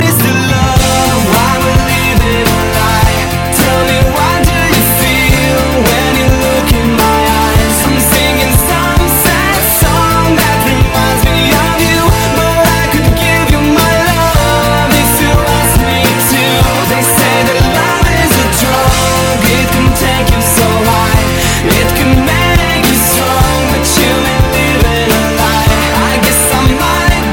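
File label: ringtone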